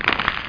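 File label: paper fold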